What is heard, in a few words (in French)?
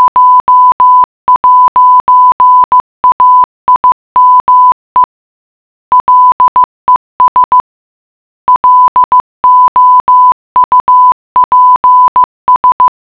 jaime les loups en morse